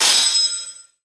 clashing swords

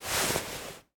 clothes rustling

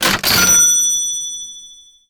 cash register calculation

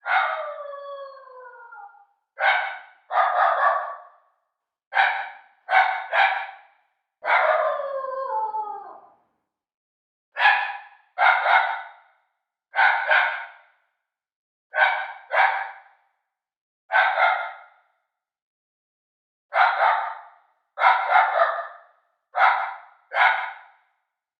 dogs howling at night

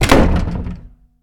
slamming wooden door

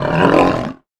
african lion growl

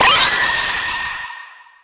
flash of light

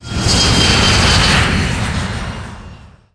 passenger plane passing close by

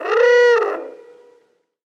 bird monster cry

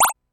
moving cursor

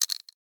pushing up eyeglasses

